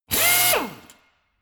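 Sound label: impactor long high, drill; maschinen; bohrer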